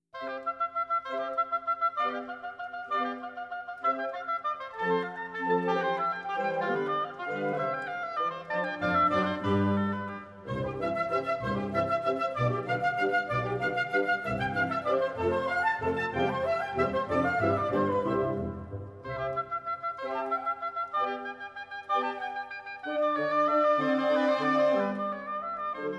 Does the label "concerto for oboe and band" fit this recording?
Yes